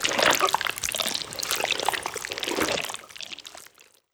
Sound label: JELLY